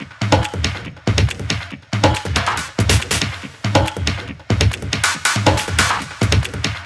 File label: Loop 140 BPM; vengeance essential club sounds-3